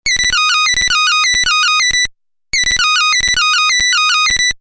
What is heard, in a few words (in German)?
kohlmeise, Vögel; midi; Polyfonisk musik; siemens